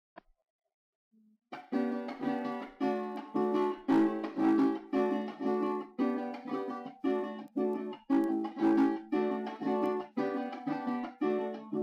Ritmo Joropo 6x8 Re

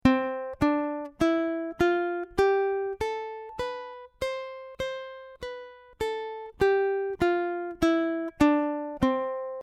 C Escala Despacio Back

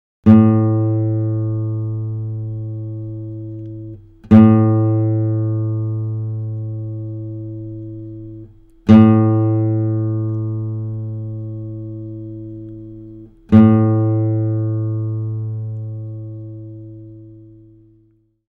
Guitarra Converitida

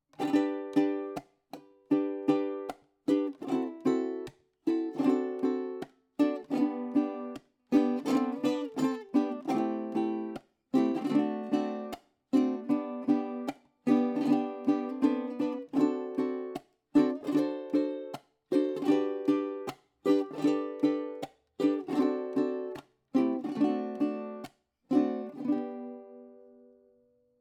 Bolero Sol Mayor

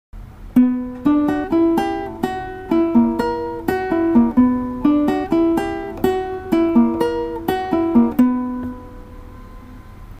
Son Cubano Intro

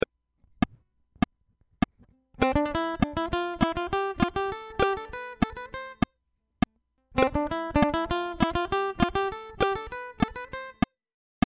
C Escala Tresillo Click

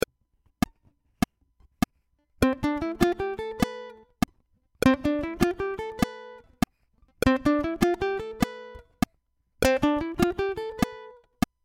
C Escala Completa Click

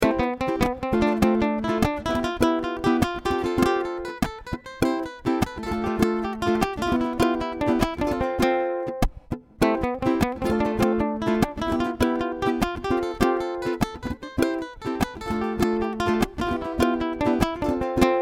C Melodia Ejercicio Click

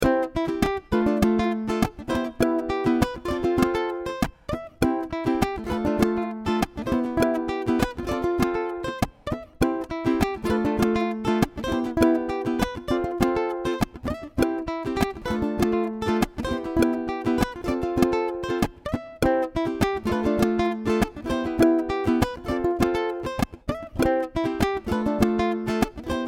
C Melodia Ejercicio Completo Click

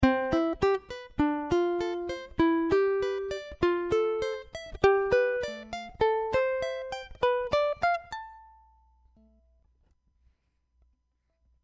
C Escala

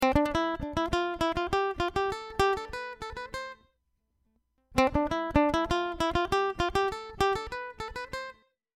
C Escala Tresillo

